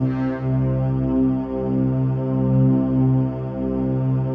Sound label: PAD, DIGI-PADS